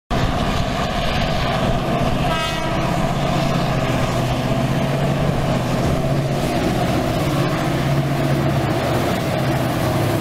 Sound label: GM 310 horn; ADIF